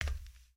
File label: Foot Steel Boot Walk, footsteps